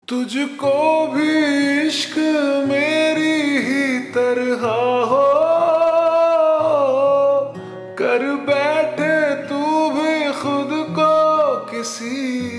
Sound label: Ringtone Slowed Reverb